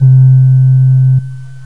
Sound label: ocarina, pipe